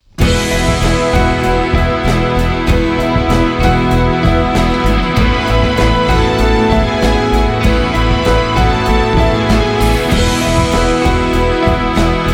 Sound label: Big Song In E